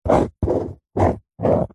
office drawing square pen on paper on cardboard box; zapsplat